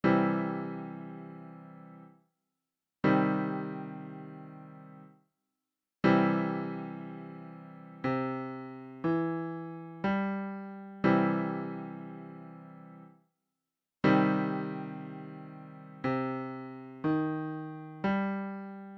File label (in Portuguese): triade M vs triade m